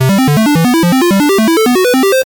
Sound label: arcade climb tone